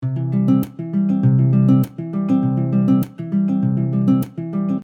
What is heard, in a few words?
Percussive Cmaj 100bpm, Soft Nylon Guitar; Percussive Patterns; MG Soft Nylon Guitar - MIDI Pack